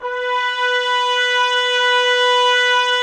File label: BRS, Woodwind Brass Ensemble; Miroslav Vitous